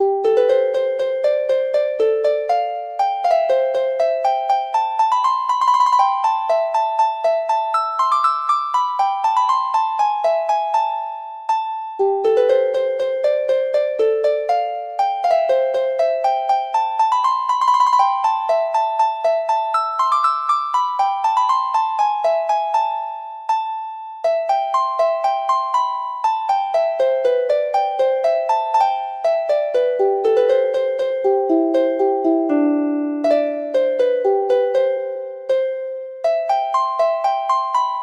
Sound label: harp